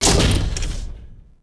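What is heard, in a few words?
door hangar Elevator end; Star Wars Obi-Wan; XBOX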